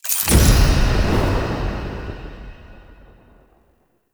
Menu Confirm, UI; Warframe; Awakening Remaster

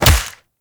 Melee Fist Impact Flesh; Fists

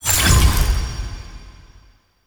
UI Mod Fusion Menu Open; Mods

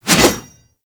Pole Arm Whoosh

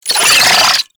Wall Climb Movement Vocal; Warframe; Oraxia

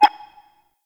Interior Orbital Station Console Rollover; Warframe; Tau

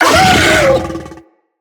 Infested Juggernaut Vocal Pain

